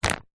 household plastic pillow or duvet bag grab pick up